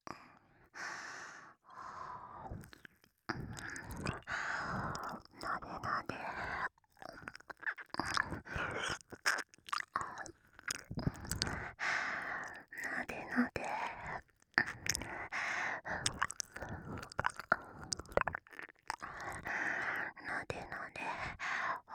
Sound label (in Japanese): 耳舐め なでなで（左